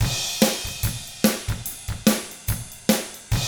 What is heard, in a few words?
ROCK, Double Platinum Drums